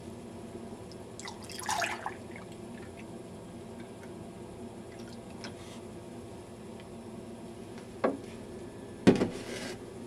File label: Pours Milk